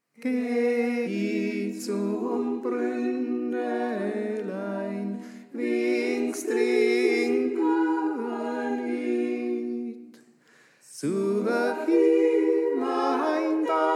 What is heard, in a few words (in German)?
Tenor; Geh I Zum Brünnelein